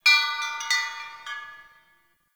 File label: Chimes, Ambience; Midrath Island; Fey Hub